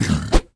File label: bug attack; dolphy